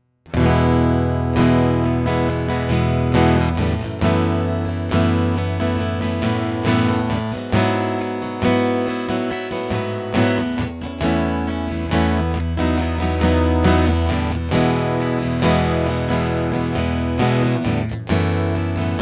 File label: Strumming Direct, acoustics, dreadnought; Gusta GDX3